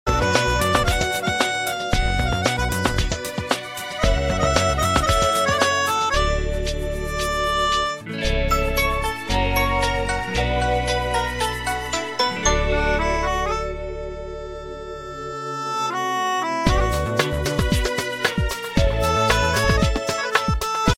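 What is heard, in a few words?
iphone violin cover ringtone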